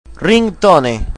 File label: ringtone